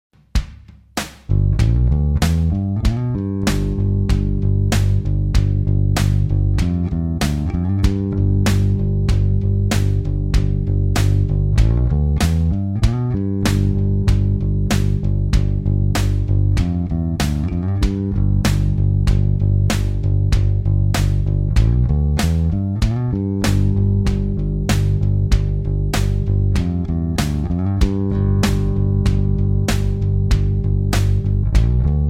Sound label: 8 note line in G